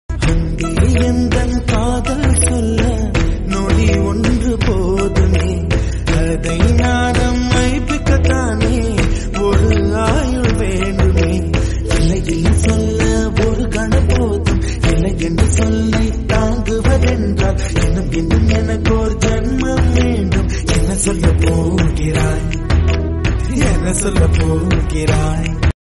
Tamil

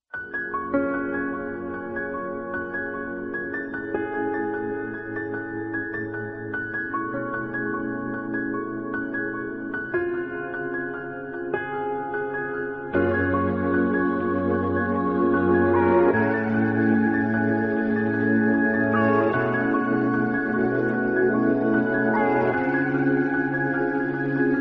Bgm Theme

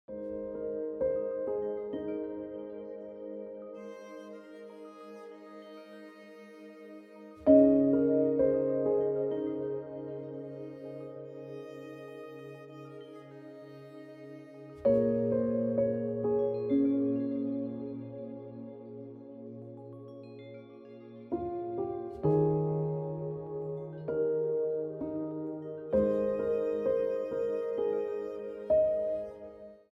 Piano Music

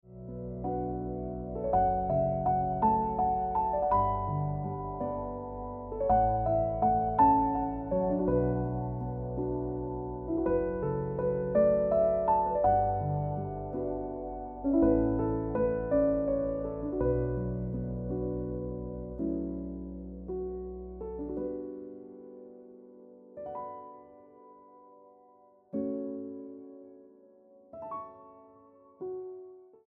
Piano Music

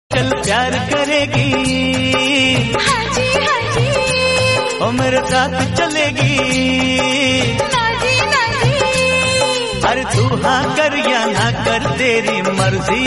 Romantic Hindi

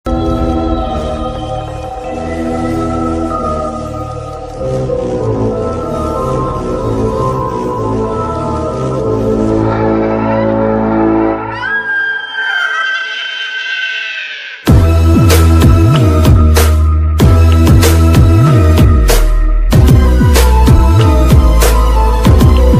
Horror Bgm; ringtone; Aahat

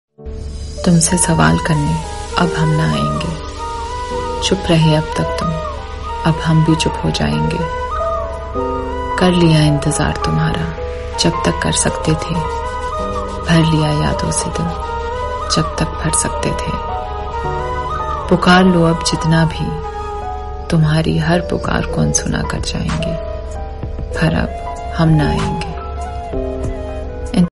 Hindi

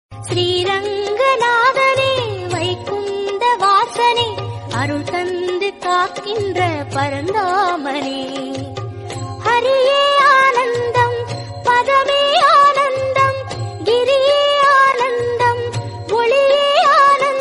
Tamil Devotional Song

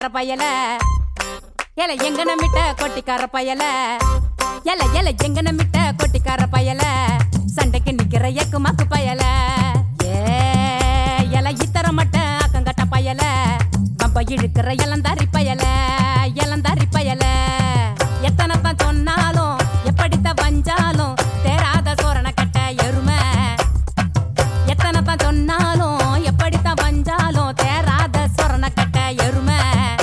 Ringtone

